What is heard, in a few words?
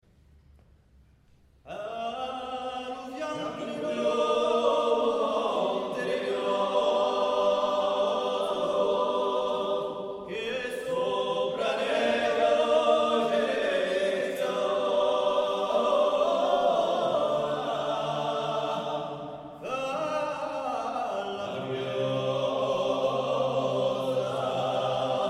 corsica terzinu, polyphony